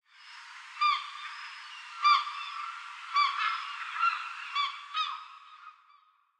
ambience ocean gulls; smite

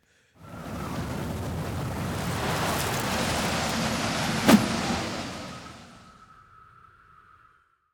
Defeat Storm; Smite; Susano

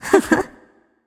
heh heh; smite; tiamat

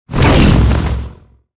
swing; smite; heimdallr

